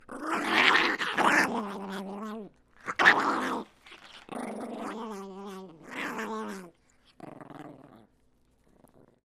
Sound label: Mad Chihuahua, Animals Domestic